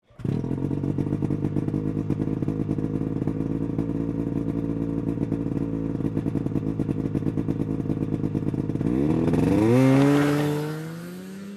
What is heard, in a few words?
Motorcycle driving away